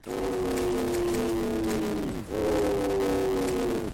ooooooooooooooo

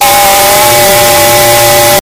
skeleton screamer long

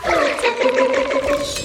sassy dancer laugh

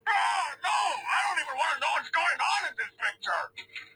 augh no

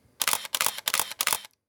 multiple shutter

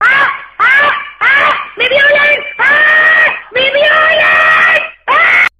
aahh

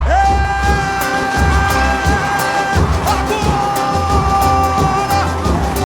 eeeeeee agooooora

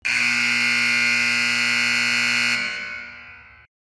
end buzz

